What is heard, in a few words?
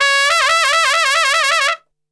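C#2 trumpet shake, Brass; Brass Super Section; TRP SHAKE; Best Service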